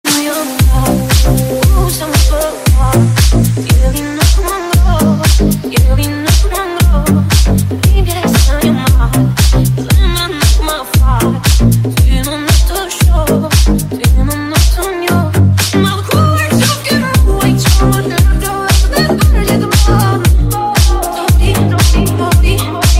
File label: best electro ringtone